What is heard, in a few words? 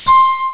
blower; Epic Collection, The; Epic Marketing